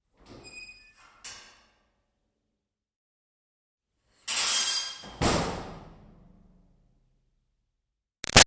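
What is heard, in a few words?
Door Metal Milking Vat Screech Open-Close; Door , Metal , Milking Vat , Hollow , Screech , Open - Close; Metal Doors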